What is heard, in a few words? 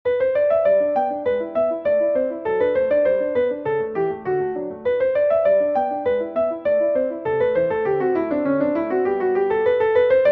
Gavotte in G